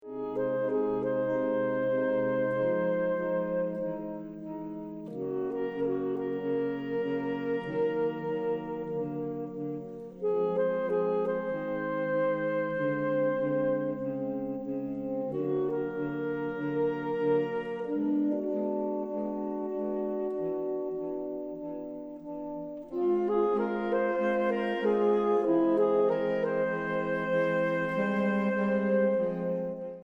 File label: glass; tetraphonics